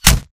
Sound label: bullet light ballistic flesh; Titanfall 2